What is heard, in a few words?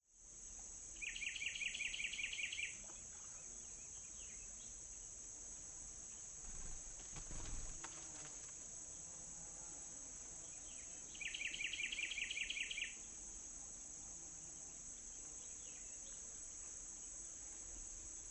Birds; EVER